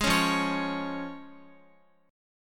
F Minor Sharp 5th F down Guitar; strummed; tuning 4E,4A,5D,5G,5C,6F

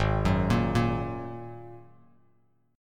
G sharp 7th Suspended 2nd G sharp Bass Standard; G#7sus2; arpeggiated; 4,9,8,3; JGuitar

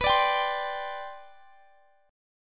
C Suspended 2nd, B, guitar chord; strummed

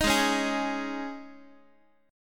G sharp Suspended 4th G sharp, Guitar; strummed

